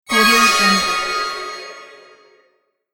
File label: dark fantasy production transition asset